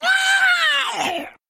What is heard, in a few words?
murloc attack; silverhand murloc